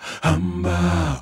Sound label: Male Voice, African Voices